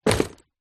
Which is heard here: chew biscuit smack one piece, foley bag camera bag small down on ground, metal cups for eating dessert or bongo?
foley bag camera bag small down on ground